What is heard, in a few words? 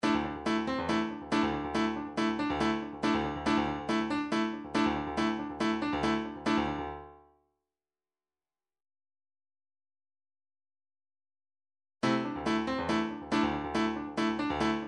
midifiles 140 bpm; neurotic slam; armageddon